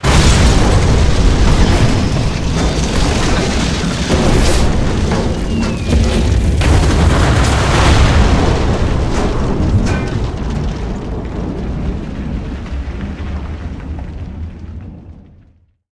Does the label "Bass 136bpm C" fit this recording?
No